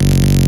Synth Shots; Vengeance Samples

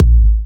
Kicks; Vengeance Samples

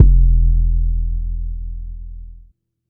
Bass Drop, 808; Street Anthems Sound Kit

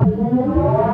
Short Fills & Reverse; Vengeance Samples

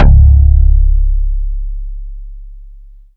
Vengeance Ultimate Bass C#, Root C#; Vengeance Samples

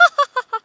LoFi Vocals Oneshots; Vengeance Samples

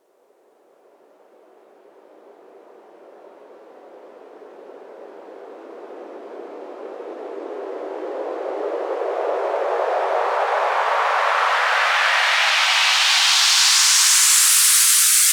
FX Uplifter

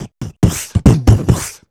Beatbox Loops, Human Beatbox; Vengeance Samples; Special Sounds

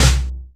VFX2 Sub

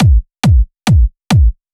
138BPM Kick 4 4, Fmajor; Drums & Perc; Vengeance Samples; Another Day Kit

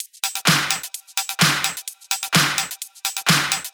Houseloops 128BPM; Vengeance Samples

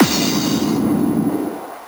FX LoFi Bomb, 128 BPM; Vengeance Samples; Vocal-Hook Kits; Song Kit; Freaky Boys

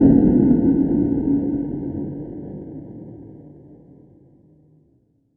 Impacts

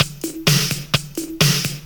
Housebeats, 128BPM; Vengeance Samples; VEH2 Loops

